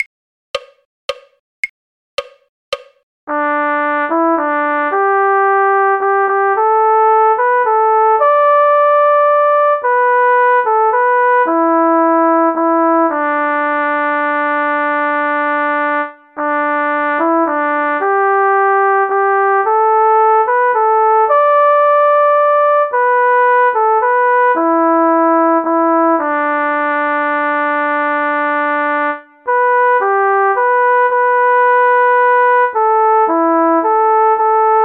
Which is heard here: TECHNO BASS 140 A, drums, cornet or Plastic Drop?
cornet